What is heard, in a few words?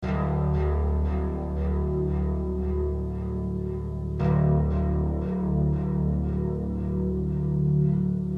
Bass Amb D 115